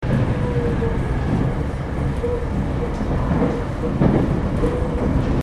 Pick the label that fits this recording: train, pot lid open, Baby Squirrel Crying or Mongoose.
train